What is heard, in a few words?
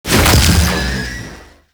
Projectile Fire; Enemies; Abilities; Warframe; Sentient; Brachiolyst